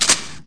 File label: famas mag in; firearms